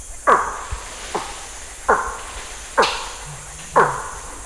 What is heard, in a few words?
hacks (eagle) C. nictitans, Putty nosed monkeys; vocalisations; compcog